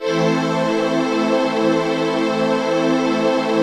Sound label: CHRD PAD; Best Service; XX Large Pads; Sample CDs